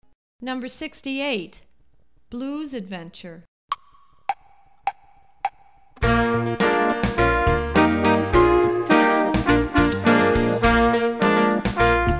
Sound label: blues; blues adventure